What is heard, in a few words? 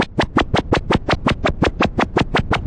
noise Modular, Noise Sweeps